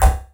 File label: DSP Hit; Sonic Foundry (Sony Creative Software); Twine-IDM